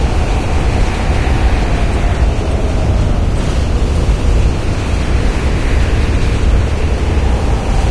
inside plane ambience loop; video game; Left 4 Dead 2; Source Engine; ambient

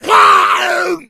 hunter pounce; source engine; left 4 dead 2

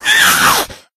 shoved, npc infected

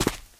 concrete, player footsteps survivor walk

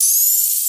hunter flechette pre explode; npc; source engine; ep2; ministrider

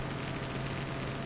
bison idle, weapons; tf

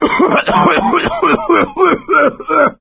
cough, player survivor voice; source engine; left 4 dead 2

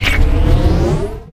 laser node power on; Source Engine; Portal 2

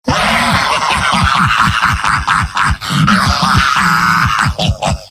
demoman laugh long; mvm

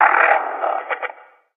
advisor screen; episodic; Source engine

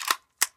grenade launcher drum open, weapons; tf

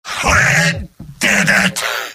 cheers

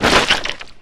hit slime splat

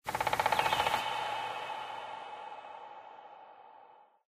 animal call woodpecker; Source Engine; tf; lair

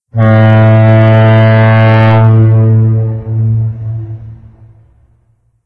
foghorn close

